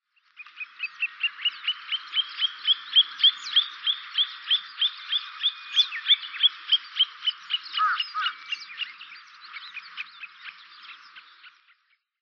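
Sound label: Long-billed Plover; ikaruchidori; utsunomiya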